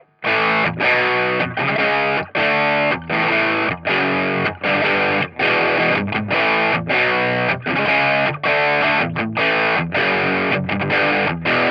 orange gain keller, tele

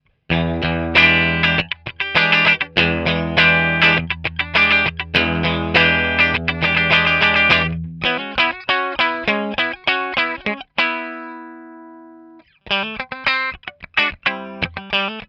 clean keller, tele

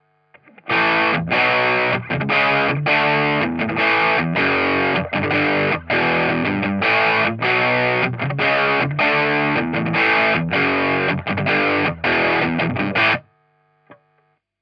orange gain std, tele

